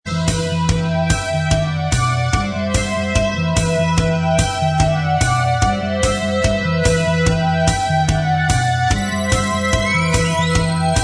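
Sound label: instrumental, backing track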